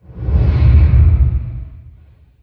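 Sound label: underground ambient banging; blackforest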